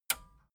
metal button radio release disable

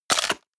medieval click medium negative

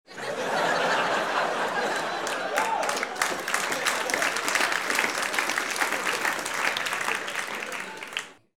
laugh applause

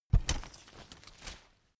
umbrella big close full

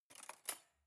cutlery cake fork drawer grab soft

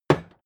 hammer wood hard

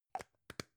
gum plastic box close soft

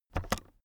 keyboard external space press fast soft

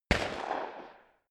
rifle single shots

